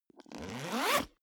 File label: zipper backpack open accelerate